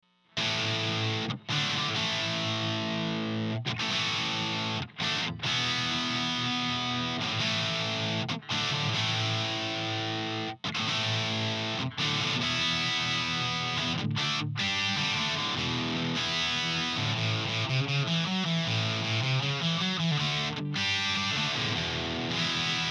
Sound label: brite mic w delay, guitar; mic placement on 1x12 combo amp guitar